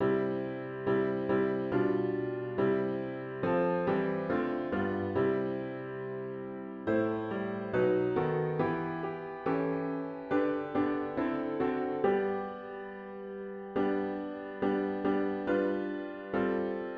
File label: piano only; Spirit of God Descend upon My Heart